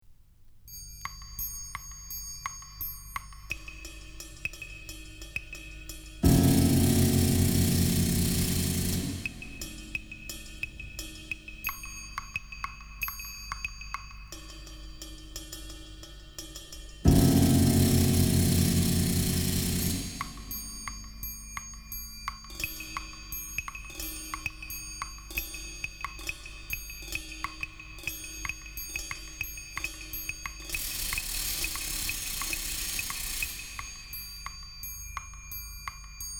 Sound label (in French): bruitage musical